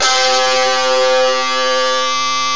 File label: guitar very long